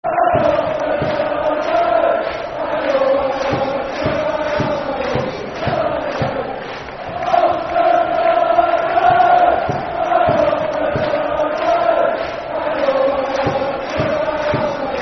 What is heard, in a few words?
Football Chant (Soccer Song) N. Ireland; Ulster Till I Die; N. Ireland N.i.f.a